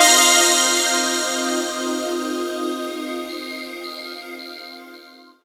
Hit - 4A, Jungle; Riffs, Arps & Hits; Blu Mar Ten - Jungle Jungle - 1989 to 1999 Samplepack

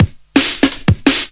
Brut Force Break, Jungle Breaks; Blu Mar Ten - Jungle Jungle - 1989 to 1999 Samplepack

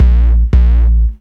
Bass - 6B, Jungle; Blu Mar Ten; Jungle - 1989 to 1999

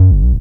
Hurt U So Bass - 10A; Jungle; Blu Mar Ten; Jungle - 1989 to 1999

